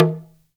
TLK DRM, Heart of Africa; Spectrasonics

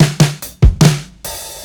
FAT 145 FILL, DRUMS LOOPS; BASEMENT DRUMS VOL 2

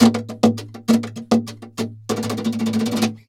flamenco guitar, duende fx; ProSamples vol.46 - Flamenco

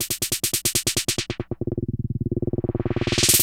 Snare Roll, Trance; Sonic Foundry (Sony Creative Software); Trance NRG VOL-2; Drums

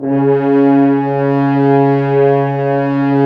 BRS, FHns Dry; Roland L-CD702; F.Horns 2

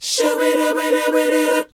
SHOO B C#, R&B Dance, vocal; Spectrasonics Vocal Planet

